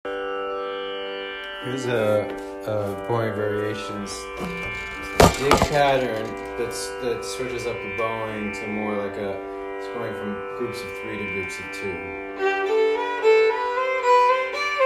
Slur 3 on four note patterns

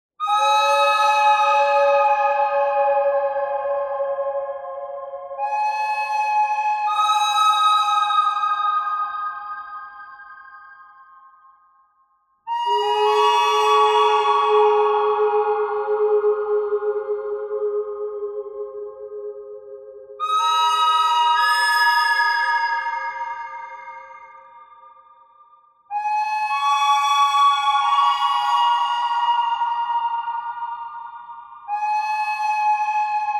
bird thread pentatonic

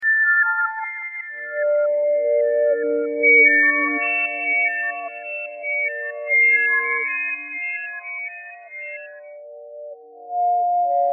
submarine echo thread noise

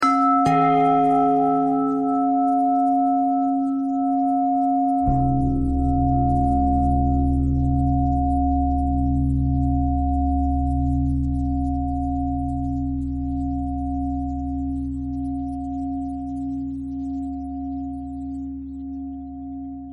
bell bell thread simple, bells

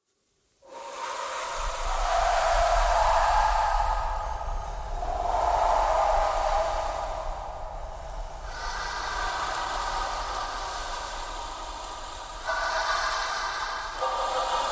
breathing thread noise

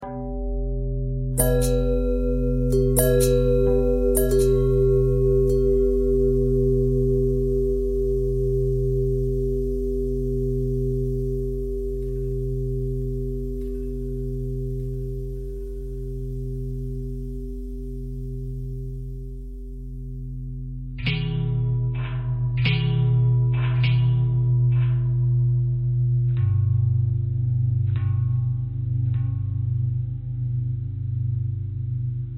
long bell bell thread simple, bells

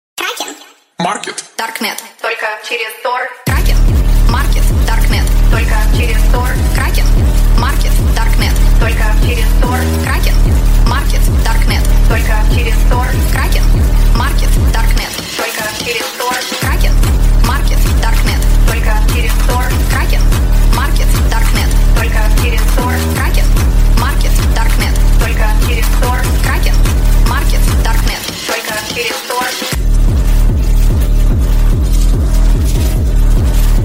hardstyle; kraken

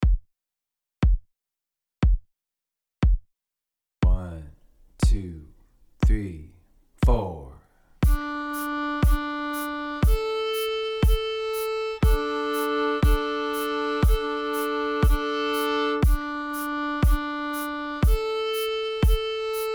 DA single double stop exercise, fiddle

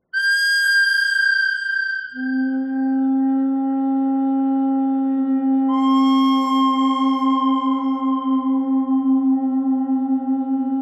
bird thread pentatonic; noreverb

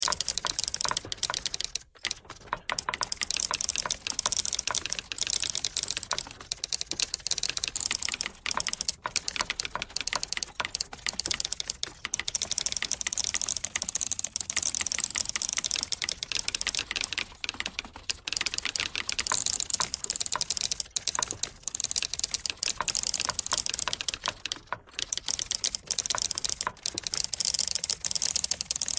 typewriter thread all

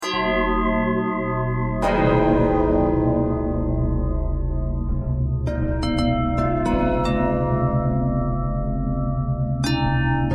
bell twist

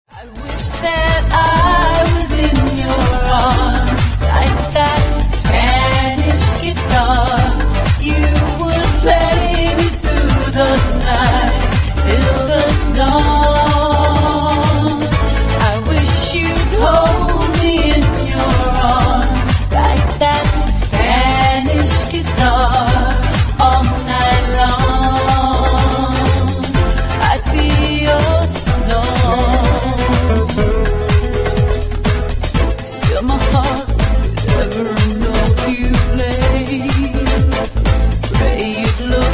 Spanish Guitar

